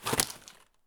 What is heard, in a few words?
shotgun drop; Escape from Tarkov